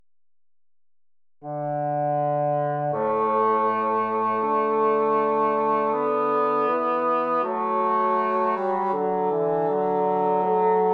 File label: Agnus, claves, Tutti; Certon; Missa Christus Resurgens; Polyphonie